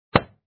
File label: bubble Pop; bubblespinner; html5 games